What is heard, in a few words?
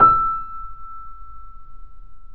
PP E 6, Grand Piano off mic; AKAI S6000 CD-ROM - Volume 2